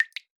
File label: Drip; Drips